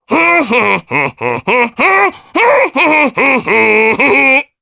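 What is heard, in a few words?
Vox Hum Japanese; Oddy; kings raid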